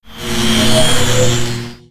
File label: Ele shock; Fly For Insanity